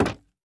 竹木活板门开关声

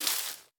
樱花 树叶 踩踏声

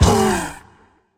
监守者受伤声

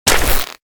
狼甲开裂声

